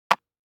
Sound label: DICE on Cardboard, Drop, Standard, 3 Three Dice; Samples; ArticulatedSounds; DICE Sfx Party (Extended)